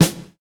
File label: sharp snare drum sound e key; 1990s